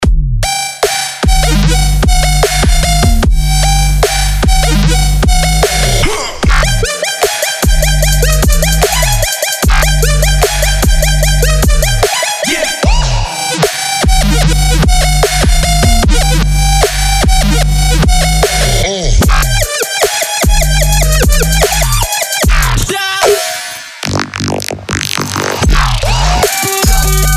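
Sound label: speaker-breaking pounding powerfully; razryv dinamika dolbit moschno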